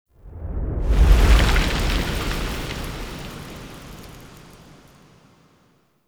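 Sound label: Distant Explosion Debris; Warframe; Ambience; Nokko Colony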